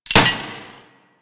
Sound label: Axe Release; Sundial